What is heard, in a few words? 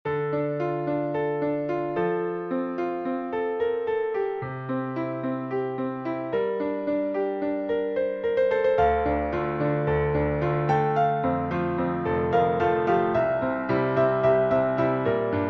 piano